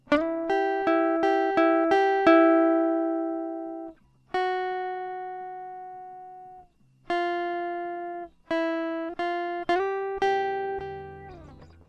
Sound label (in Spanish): Sample Guitarra Original